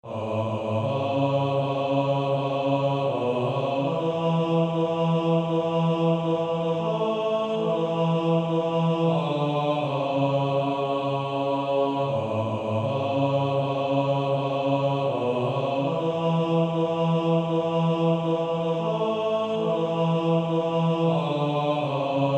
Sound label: Male Choir B1